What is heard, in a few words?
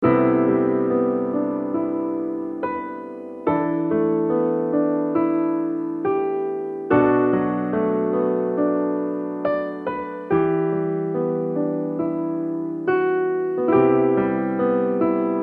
piano